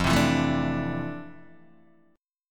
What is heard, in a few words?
F Major 13th Guitar Open D